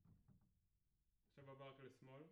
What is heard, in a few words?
Piano A